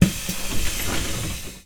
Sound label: close